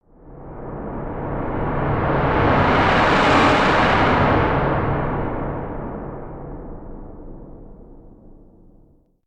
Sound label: Hit Swoosh Monster Swoosh; Brand X Music; Brand X Music Catalogue - Editor's Essentials